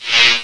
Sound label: electric; Odyssey